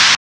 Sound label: SCREETCH, PHUZZ DRUMS; Spectrasonic Distorted Reality 1